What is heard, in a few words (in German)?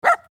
Hund bellt